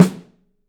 Drums, STEEL; 3D Drums

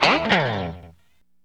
GR LICK, Stereo Guitars; E-MU Formula 4000 Series Vol. 1; Hip Hop Nation

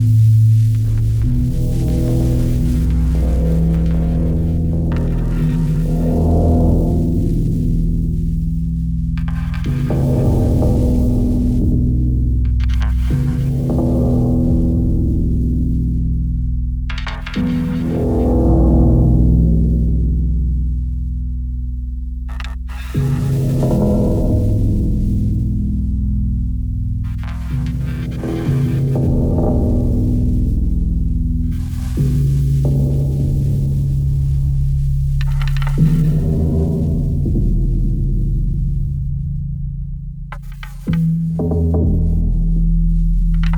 texture, drone pad texture; Polyend; ARC Noise

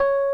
ELEC. PIANO; OMI - Universe of Sounds

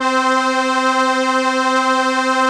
ARP C3, STRENS; Sonic Reality; Vintage Timetraveler

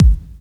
BD, Dance Mega Drums, BD HOU; Best Service

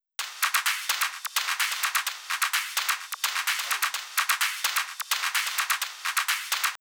Drum Loop 128 BPM Loop; VEC5 Multi Drum Loop 50

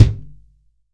TUBE KICK

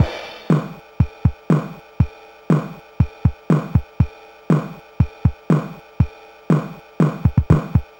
Apple II Rock Beat, Drums, Chiptune; Sonic Foundry (Sony Creative Software)

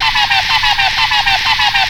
FX; AMG Megabass REMIX! VOL-2

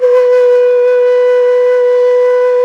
NYE FLUTE; Nye Flutes

